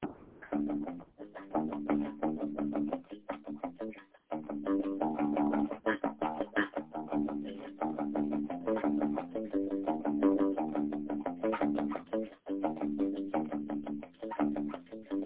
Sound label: quick plucking could be bassline